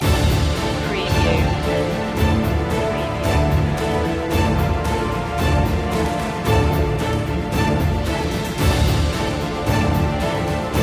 no choir

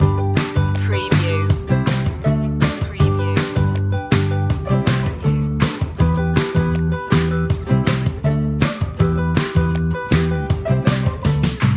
light upbeat

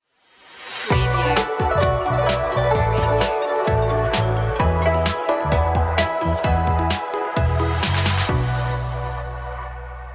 good luck stinger

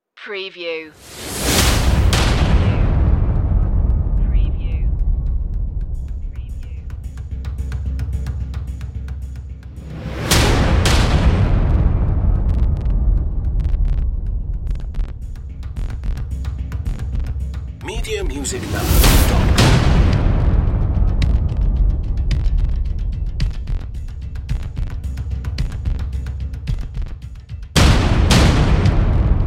drums and fx

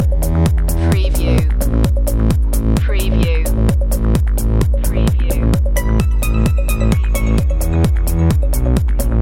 the club loop

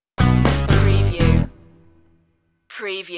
funky strut stinger

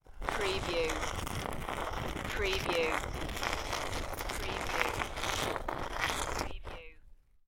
Stone Grind - Scrape Along Stone Pillar - Long

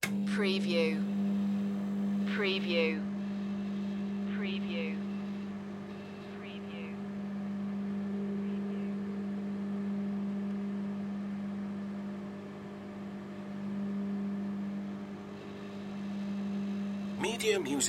microwave oven

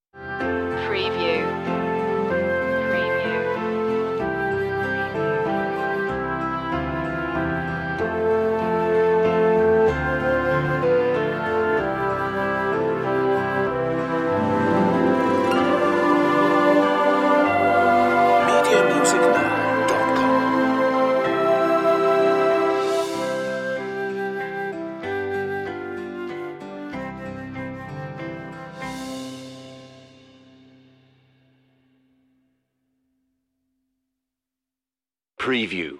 orchestral; silent night; media music now

